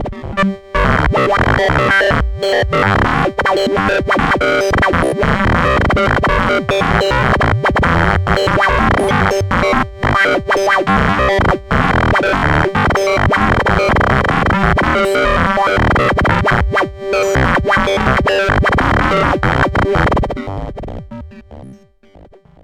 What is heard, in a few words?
Neutron Pentium Computer FX; Behringer